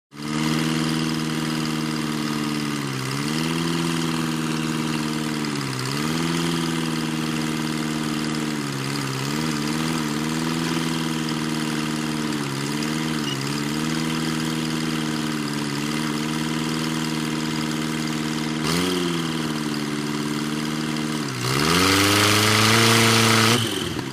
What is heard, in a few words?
750 Dozer Revs, john deere 750